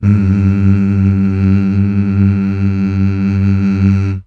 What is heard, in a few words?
MMHH